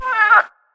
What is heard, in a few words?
PSILOTOAD negative